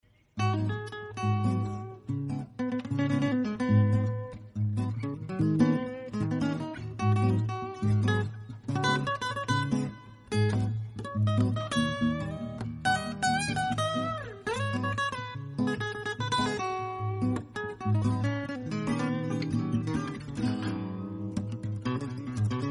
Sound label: soft guitar tone ringtone